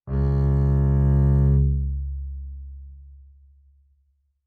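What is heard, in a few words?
Bass arco fortissimo sul A Db2, Double Bass; MIS Pitches - 2014; Strings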